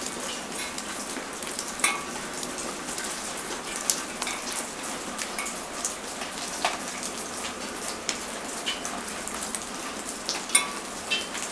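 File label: rain metal loop; ambient soundscapes; dota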